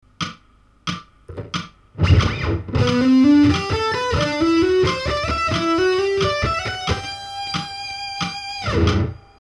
string skipping slow

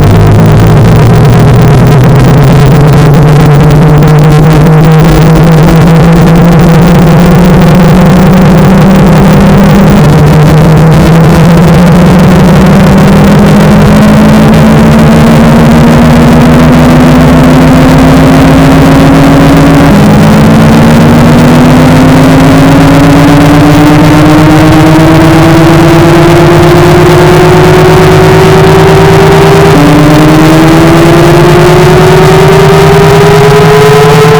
Loud noise; baldis basics

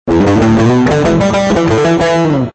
damped

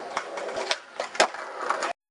kickflip

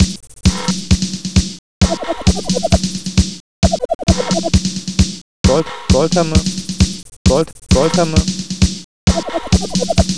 GOLD DRUM; Calella

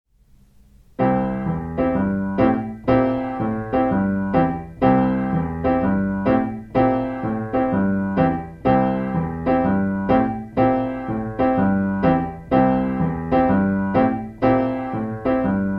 Blues Piano

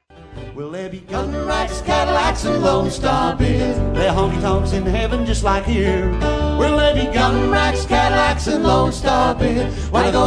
honky tonks

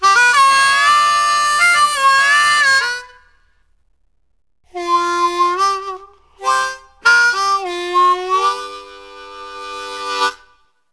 HARMONICA

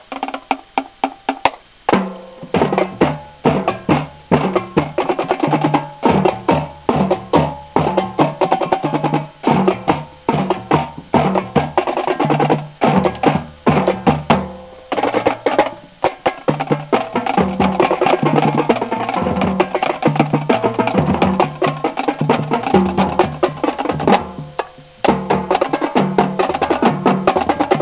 cadance, drumline

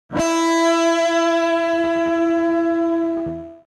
vibrato

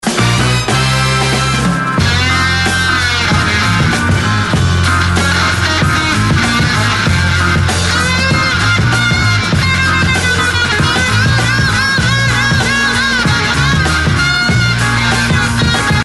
solo sample

